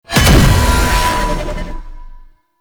Aoe Wave Impact; Enemies; Abilities; Warframe; Tau; Dax Medic

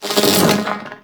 Projectile Whizz By; Enemies; Abilities; Warframe; Sentient; Brachiolyst

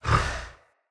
Camel Idle Breath; Khaban Camel Vendor; Vocalizations

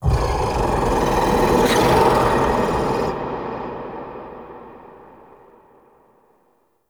Whispers Large; Ambience; Mitw; Warframe; Entrati

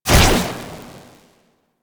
Amp Chassis Fire, Amplifiers

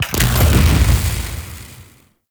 Gas City Tesla Coil Explosion; Warframe; Corpus Gas City; Gameplay Remaster; Ambience

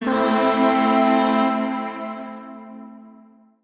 Shawzin Pent Maj Chord K; Warframe; Zariman; Void Shawzin; Chords

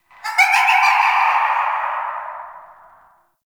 Canvas Laughs; Gameplay; Warframe; Shadowgrapher

